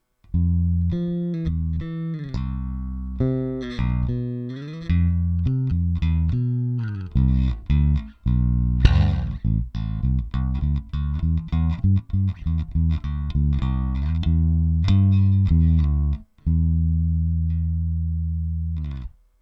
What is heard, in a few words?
trace elliot ELF finger